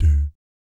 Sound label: DOOT D2, Vocal Jazz FX; Spectrasonics; Vocal Planet; JAZZ COMBO